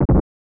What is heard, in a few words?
Crash; SNES